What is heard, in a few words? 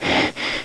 breathe; Ferazel's Wand Demo